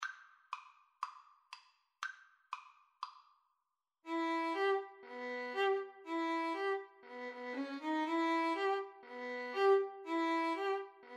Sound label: Bulgarish violin